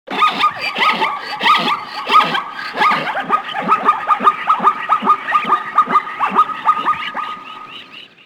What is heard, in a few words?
Zebra sound